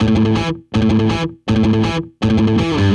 Indierock Guitar, Am, Punk & Indie; Rock Hard

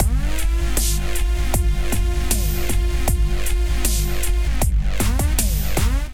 groove 130bpm; Underground Rush Kit

